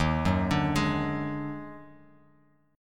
D sharp Minor Major 7th Double Flat 5th D sharp Guitar Open F; arpeggiated